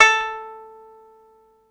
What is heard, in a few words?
a2, Banjo, MIRAGE; ProSamples vol.55 - Retro Sampler; Banjo + Fiddle